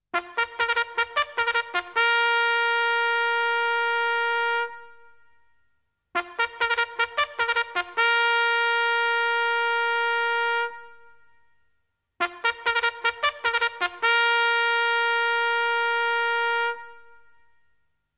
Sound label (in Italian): suonerie tromba militare